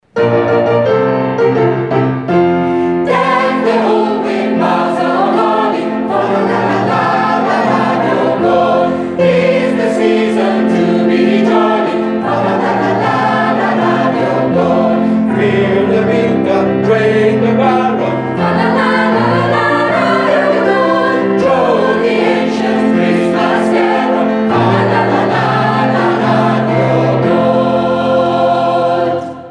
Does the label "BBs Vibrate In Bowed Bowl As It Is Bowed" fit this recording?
No